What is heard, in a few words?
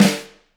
room Flam, drum